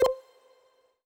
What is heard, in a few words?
Synth C, Synth Prophet One Shots; Electronisounds